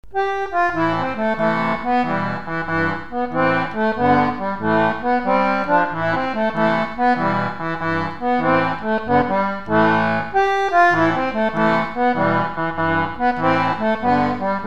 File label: jacks health, bandoneon